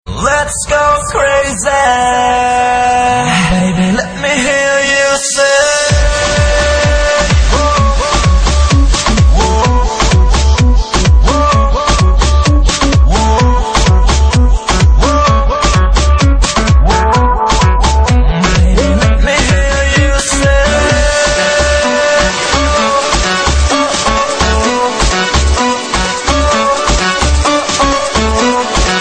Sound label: Dance House mix